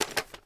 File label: reload quick end, weapons; gmod; viper